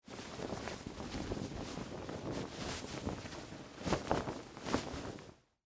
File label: Short Sleeve Put On, Clothes, Shirt; Foley; BlastWave